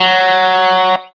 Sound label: electric guitar